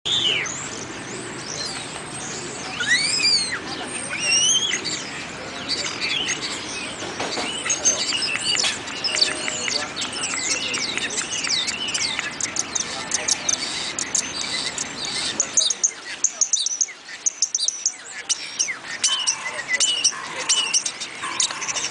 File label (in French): Le Chant de l'Étourneau sansonnet